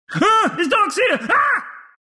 dark seer scream; announcer dlc

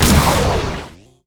frost arrow; weapons; dota; drow ranger

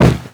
body impact medium

